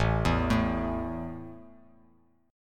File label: A 5th A down, chord; arpeggiated